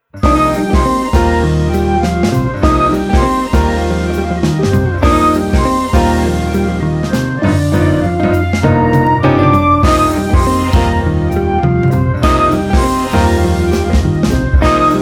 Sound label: Hive Alive Instrumental